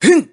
"hoo"

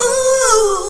"ooh" female SUNG; ooh ahh

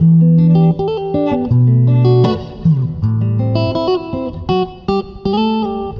guitar waltz, Loops, Phonky

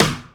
Snares, Rimshot

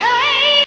ayy, Voice

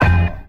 bassy

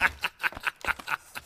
laugh

